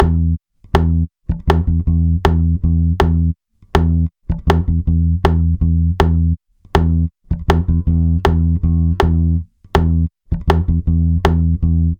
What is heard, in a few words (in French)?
Rythme Note Morte 80bpm